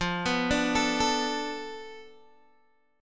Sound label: F 6th Flat 5th F Guitar Open G